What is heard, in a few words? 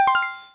notify friend